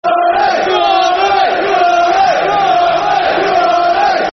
Football Chant (Soccer Songs); Blue Army; Southend United Sufc

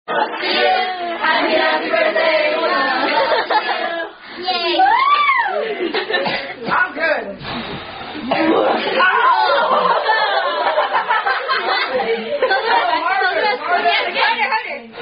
Happy, Happy Birthday (At Birthday Party)

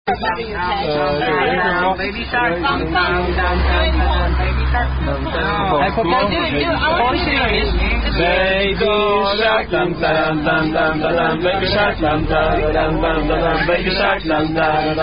Attack Song; Wagon Ride Shark Attack 1

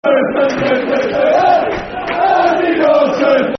Football Chants (Soccer Songs); Andy Dawson; Hull City Hcfc

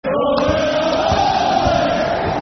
We Are Blades, Football Chant, Soccer Song; Sheffield Utd Sufc

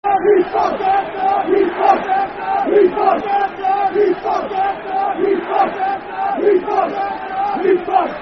Football Chant (Soccer Song); Hed First; Aberdeen Afc

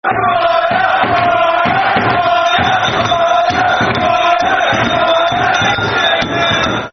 Football Chants (Soccer Songs); Who Are Ya (With Bells On); Portsmouth Pfc

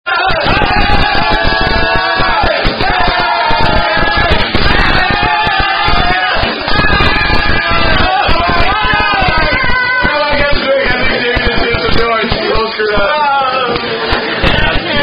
drinking game; Bunny Bunny Dibby Dibby Viking Drinking Game

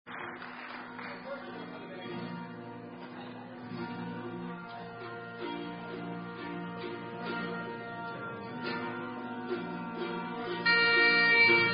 Pipes

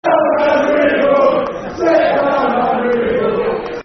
Sit Down And Read A Book, Football Chant; Soccer Songs; fanchants; Huddersfield Town Htfc

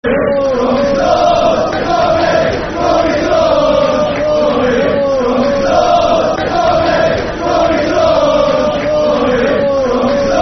Football Chants (Soccer Songs); Tom Soares; Crystal Palace Cpfc

